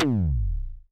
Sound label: Mid Tom, Yamaha PTX8